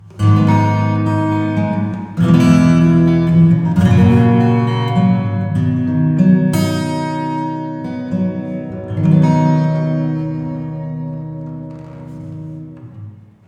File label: Plate Acoustic EMT140 Rooming; UVI